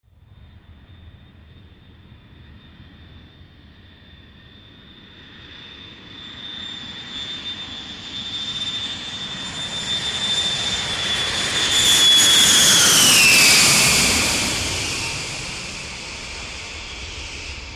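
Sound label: Large Jet Pass Low Land, jets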